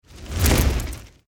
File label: Fire Torch Pass By Heavy Crackle Whoosh; Flames; Objects